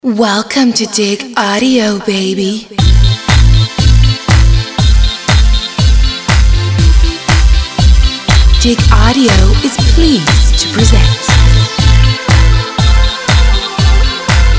Pop Nation

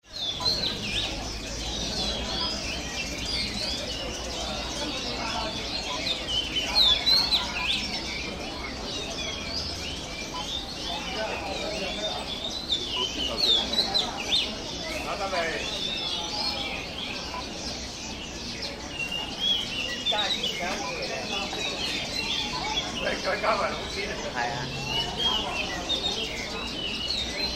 Hong Kong Bird Market Ambience

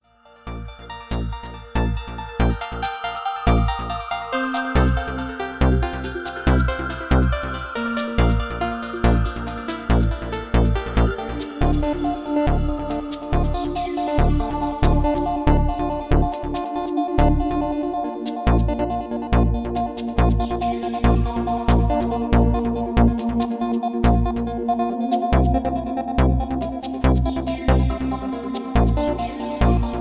Ambient

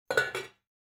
pot lid close

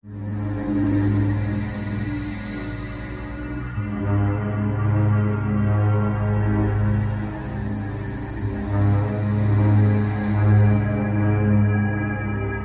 Epic Evil

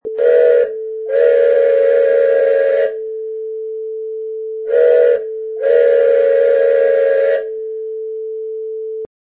Train Whistle

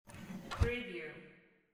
cabinet door close

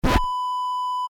record scratch

